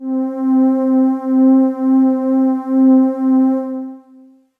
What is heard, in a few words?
Kawai PHm Grand Str C4